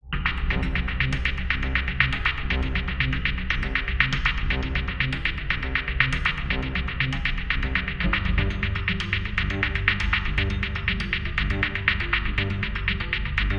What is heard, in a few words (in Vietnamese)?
nhac nen hoi hop phim dieu tra pha an